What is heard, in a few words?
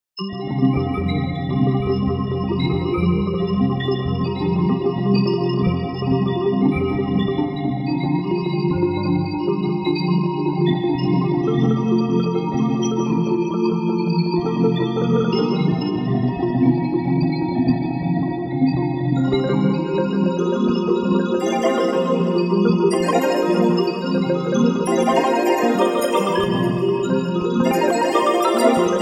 Bouncing Bells